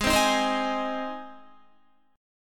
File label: Ab Major Ab down Guitar; Ab strummed